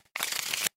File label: Bonus Shuffle; slots; House Of Luck